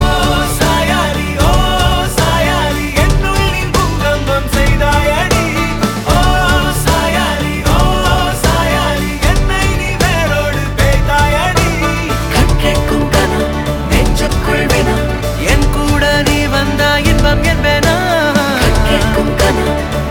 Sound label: Male Ringtone